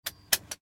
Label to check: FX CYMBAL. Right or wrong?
Wrong